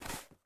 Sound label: Box Take; Game rip; MiSide